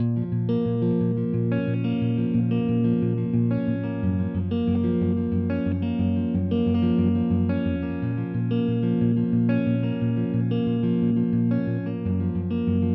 Guitar Loop 120BPM C#m, chords & melodies; 99Sounds; Monosounds; Music Loops; Instrument Loops; Guitars

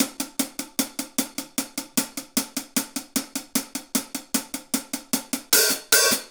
Hi Hat Pattern; Percussion; Essential Percussion; Hi Hat Patterns

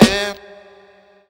VX, Hip-Hop & R&B; USB Soundscan vol.47; AKAI

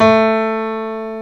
BOSENDOR A3; East West - Global Sounds

